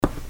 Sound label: Footstep Tile Left